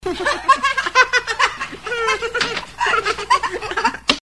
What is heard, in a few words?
children laughing; people